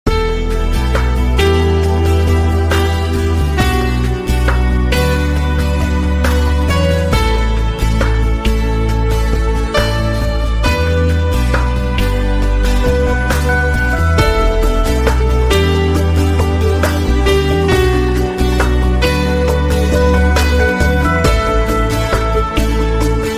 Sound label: instrumental